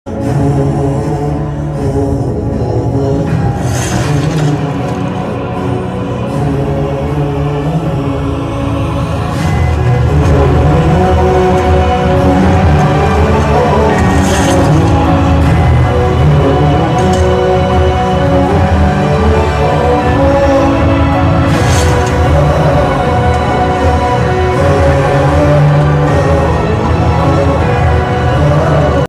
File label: movie climax emotional bgm ringtone movie bgm ringtones; Devara